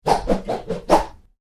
Swish: Multiple Swishes, Short, Light; small; Whooshes; Sound Design

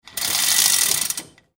Chain Through Pulley Fast Speed; Metal Sound Effects; LYN103 package of Chain Sounds; Lynne Music

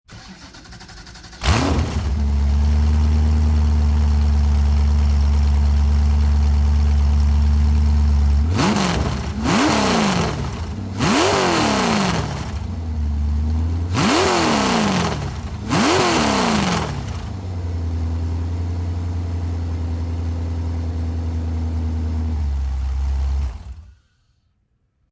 Chevy V8 Hot Rod Engine Start Rev Shut Off; Hot Rod, start ignition, idling, revving several times, switch off; LYN096 package of Hot Rod sounds; Car Sound Effects; LynneMusic

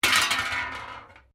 WRECK METAL PART IMPACT METAL, Wrecked Cars; impacts, crashes, scrapes; Sound Effects:Objects

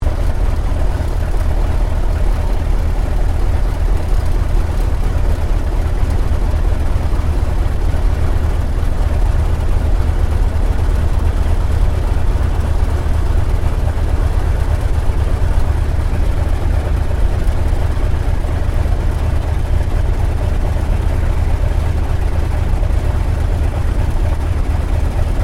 AIRCRAFT T-28D TROJAN IDLE INTERIOR; Airplanes; Vehicles

